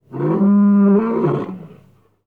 lions beastly

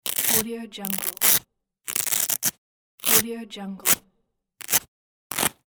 velcro

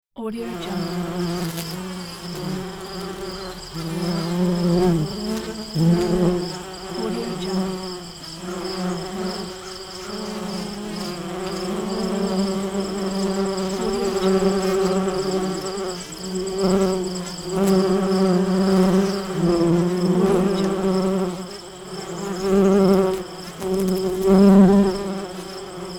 bumble bees and crickets

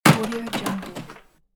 slam the door

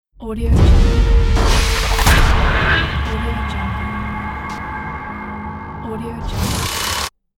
static horror sfx intro

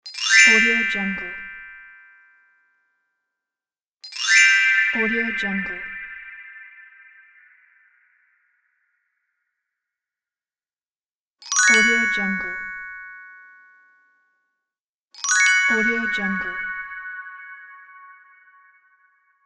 magic chimes; bestsfx

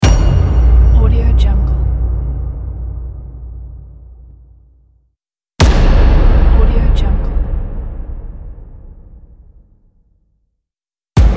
scary impacts

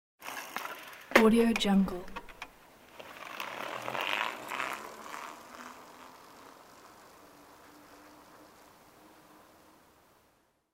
bike

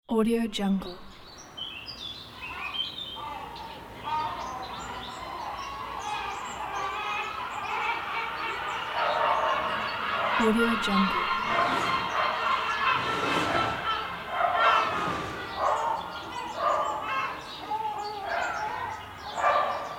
residential ambience

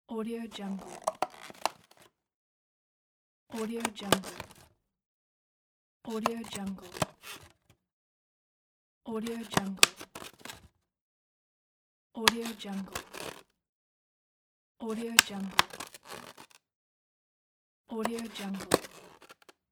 styrofoam food container close

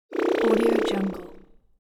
alien laser

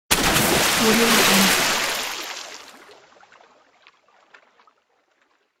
water splash

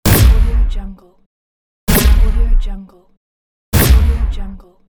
futuristic weapon

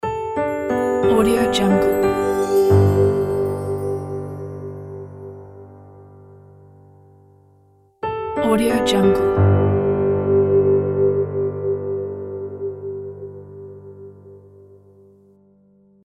piano ident

